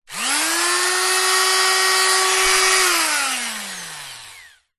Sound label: industrial power tool multi tool on off short burst